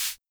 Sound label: Shaker, Hammond Autovari 64 Drums